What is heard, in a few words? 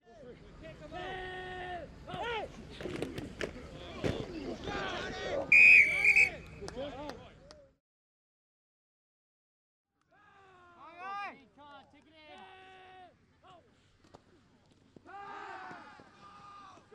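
American football amateur game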